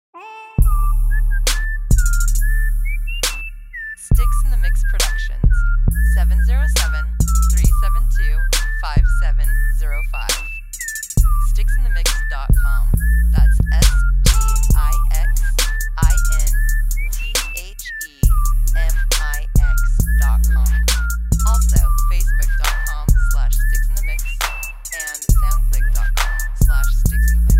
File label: Slow Whistle